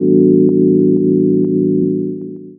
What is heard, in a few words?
Chords Tones; Equipped Music; Loungin House